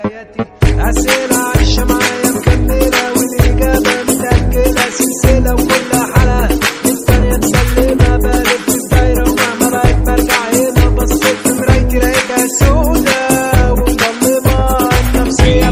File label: tiktok arap remix